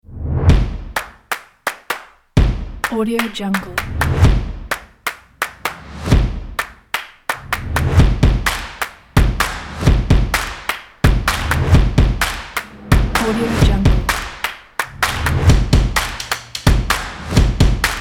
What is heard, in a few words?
claps